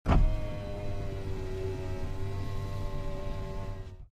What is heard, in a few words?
Car Window Close